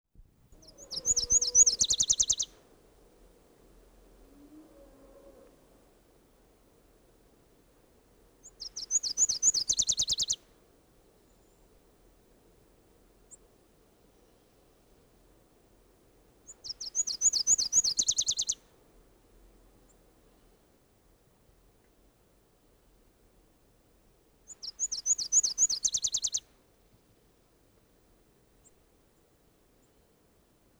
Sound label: Nashville Warbler, Leiothlypis ruficapilla; warblers